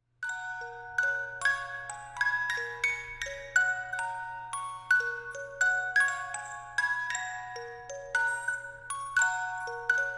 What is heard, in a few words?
creepy jack in the box sound